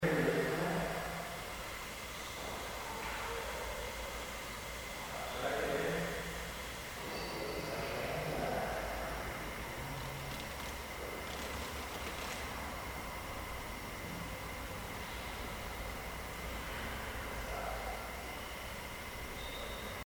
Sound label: Third Floor Unexplained noise; Case 024 Pocahontas Fuel Building II